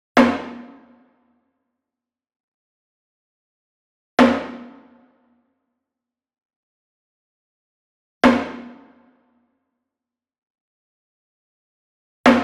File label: Wood Percussion Wood Drum Floor Hit Beater Medium; boom library; Cinematic Strikes Construction Kit